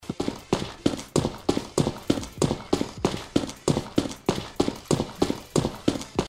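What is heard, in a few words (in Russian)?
shagi, footsteps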